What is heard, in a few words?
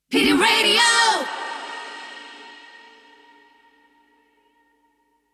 Radio Jingle, 128bpm, ACAPELLA; Pidi Radio